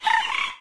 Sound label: nature exclamation; windows 9x; sound schemes